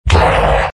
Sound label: Big pain; Undying